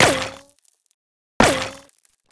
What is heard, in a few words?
Resynth Stone Hit Gun